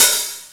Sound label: DIRT OPEN, DRUMS; Kid Nepro; Elite